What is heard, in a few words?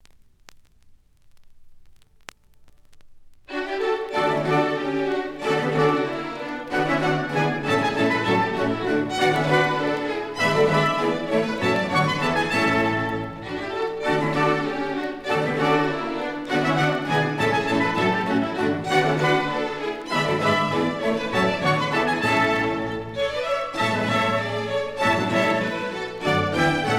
Bourrée; Bach; Suite No.3 In D Major, Bwv 1068